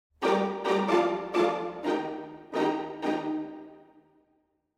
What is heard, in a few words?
Orch Ens Pizzicato; Nucleus